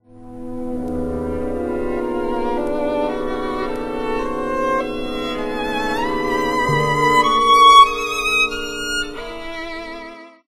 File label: tone row; Berg; vn conc